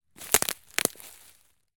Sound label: foley footstep twig snap forest floor; fork media